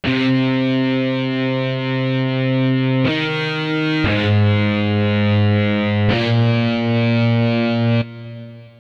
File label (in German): korg i3 e gitarre; Korg i3 Test